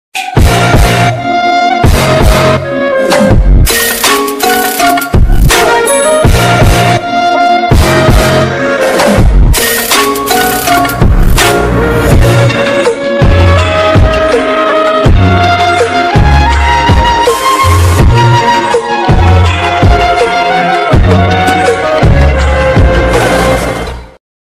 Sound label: love bgm ringtone love song bgm ringtone; madhavan